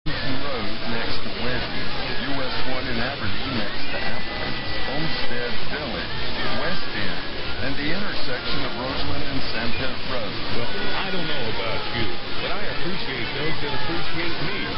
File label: Southern Pines NC; 990 WEEB